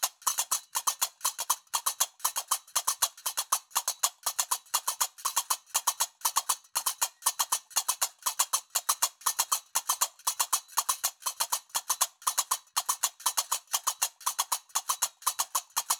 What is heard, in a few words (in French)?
loop binaire tempo 120; qaraqab; qraqeb; Maroc